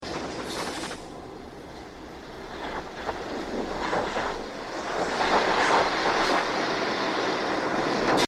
sizzling flicker

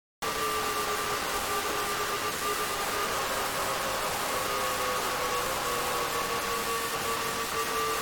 ambush near

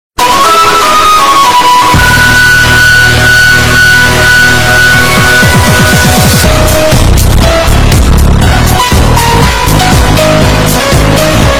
lovely sound alert

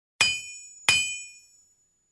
anvil sound effect

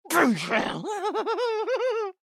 laughing; junkrat

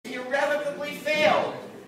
failed

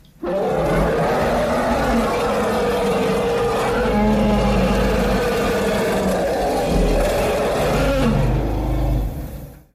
demonic roar

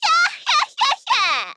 laught; miu iruma

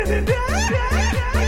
heyyeyaaeyaaaeyaeyaa

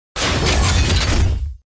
transformer sound

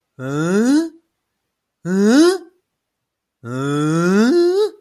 HAAAAANNNN!!!

